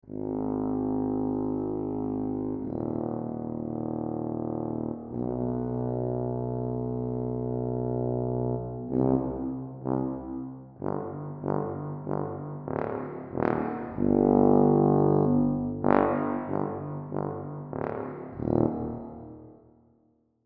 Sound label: Tuba